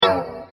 Laser Crossbow Pistol, laser gun, science fiction sound effect; star wars / trek style games